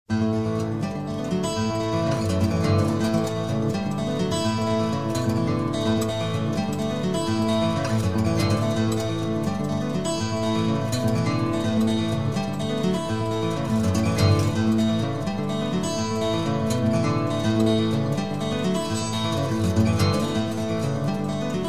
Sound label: acoustic